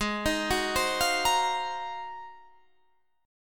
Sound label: G sharp 13th, Guitar, Standard; arpeggiated; JGuitar